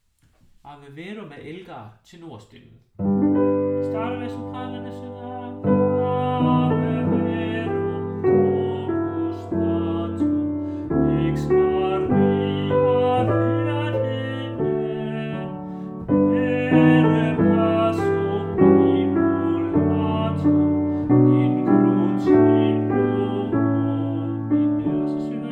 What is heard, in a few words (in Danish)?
tenor; Elgars Ave Verum; harlev koret